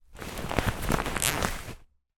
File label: toy inflated rubber ring movement in hand